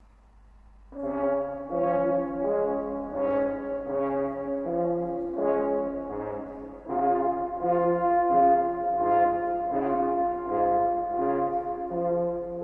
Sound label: hubertusmesse gloria, 2 horns; bakovsky p